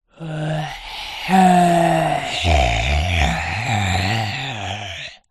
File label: horror zombie groan child